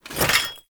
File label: Generic Foley; Warframe; Warframes; Hoplite